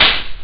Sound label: hihat; Amiga Soundtracker Sample Packs